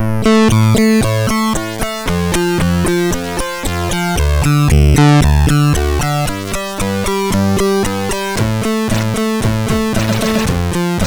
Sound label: Random Access tune, sid MOS6581R3; hvsc